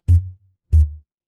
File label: ui alert